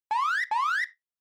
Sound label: Warning Siren High Short